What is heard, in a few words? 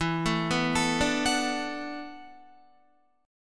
E 9th Suspended 4th E down Guitar; arpeggiated; chord; JGuitar